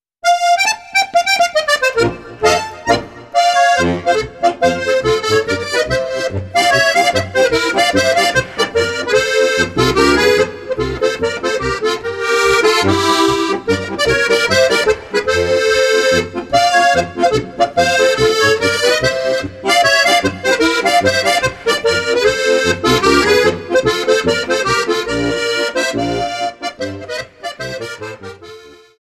harmonika

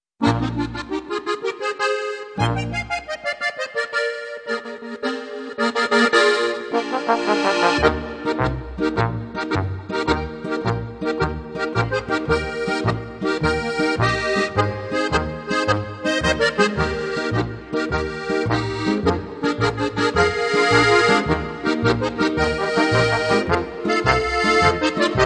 steirischer harmonikamarsch; Marschmusik

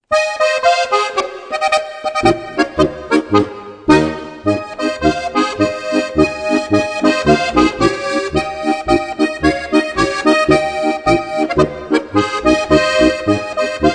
Polka

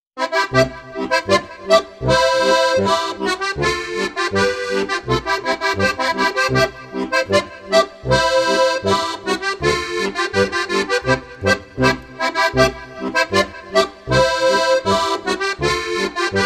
boarischer

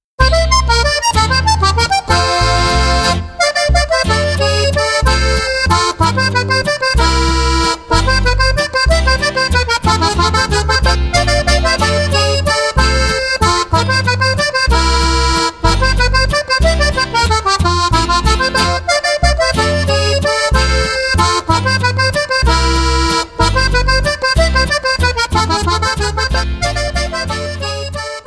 jubilaeums walzer

